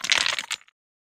stones; RPG

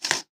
wood breaking

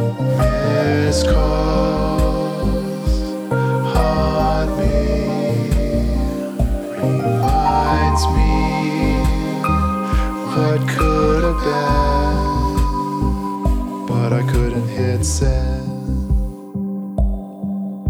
Chorus; Weird

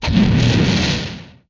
Explosion, retro arcade; MMRetroArcadeSoundsPack

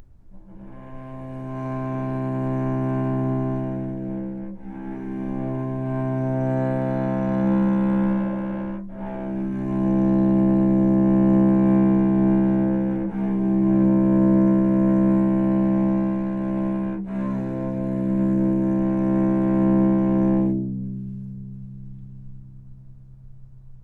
C2 - Sustain, novice cello

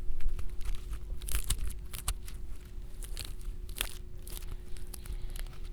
Crinkle Plastic; BB Retail Therapy Sample Pack

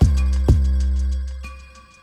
Bassy Drums; BB 2HTC Samples Vol 4

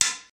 wood hit; wood metal SFX

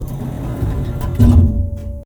elevator move; movers; warfork

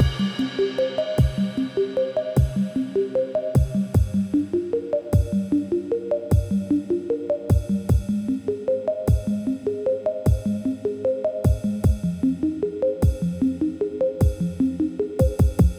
Glowing Arp; BB 2HTC Samples Vol 4

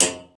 metal sheet; wood metal SFX

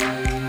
Chunky Clap; Drums; BB 2HTC Samples Vol 4

